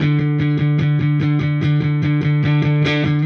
Silos Dirty Strum Guitar, Indie Rock

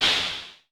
CLAP, Vermona Mono15 Drums; Stereo layers; blend with snare

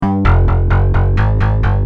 Bass, Industrial

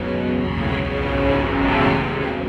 Fat Loop, Electric Guitar; Sonic Foundry (Sony Creative Software) - Friendly Fire

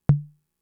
Tom, Fricke MFB-522; TOMS

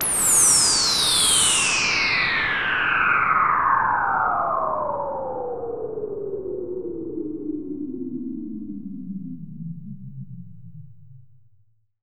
ZIP IN AIR, SCI FI; AKAI Anime Sound FX

